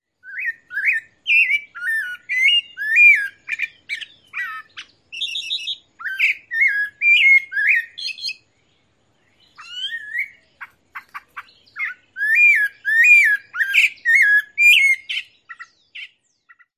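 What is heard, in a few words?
song and calls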